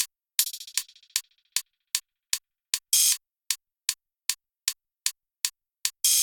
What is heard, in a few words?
music; RapidTyping Tutor